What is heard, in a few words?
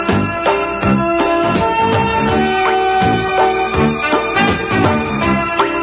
funky